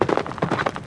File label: horse move